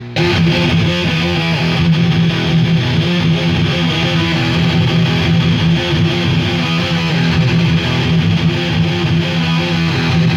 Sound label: METAL